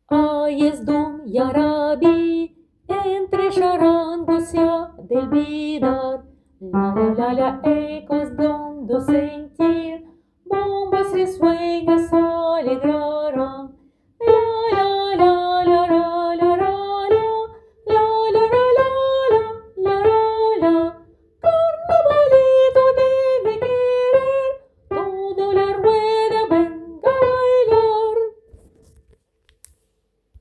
Carnavalito Soprano

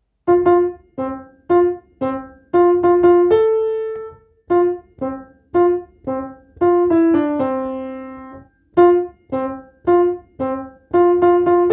NOEL COMME Musique; noel jazz

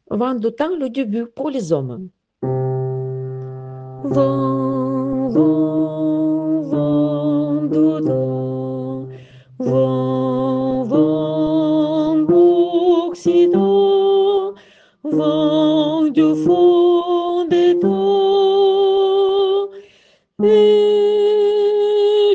Vent d'Autan debut hommes

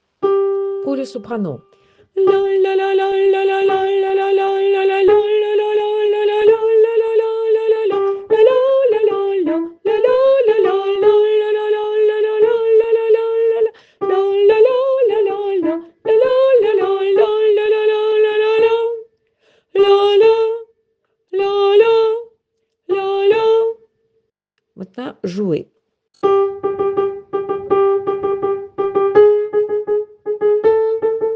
Carnavalito debut Soprano

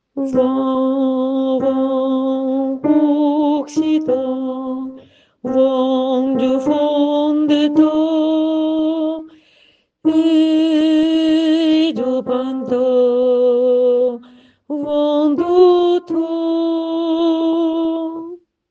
Vent d'Autan debut alti

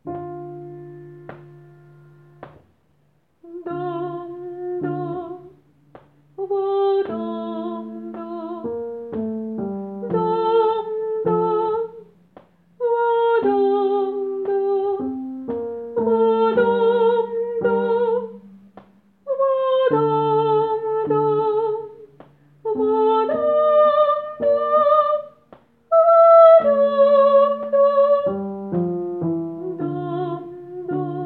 Sarabande Soprano

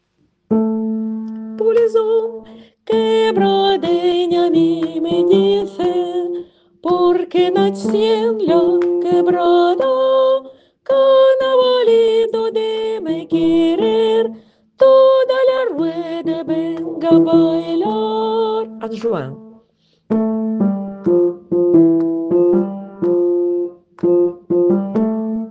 Carnavalito couplet hommes

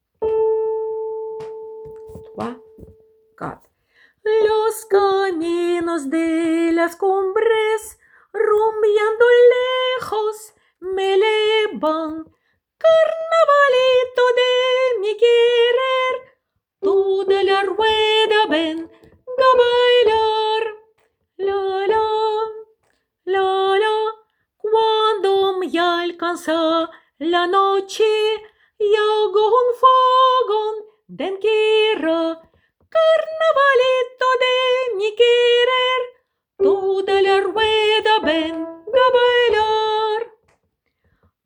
Carnavalito Soprano la fin

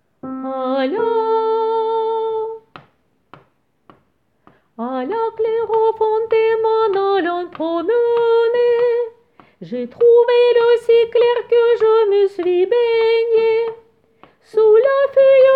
A la claire fontaine Soprano

